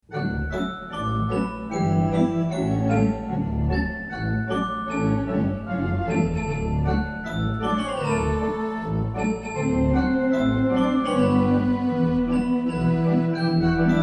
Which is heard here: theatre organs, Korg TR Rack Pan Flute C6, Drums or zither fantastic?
theatre organs